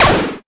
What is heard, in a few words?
electro perc; Hip Hop; Precise Electro Percussion; style kits